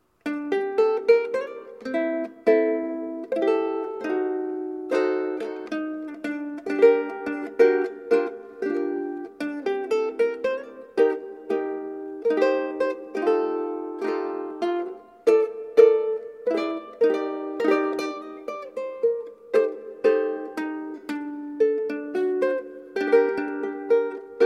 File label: uku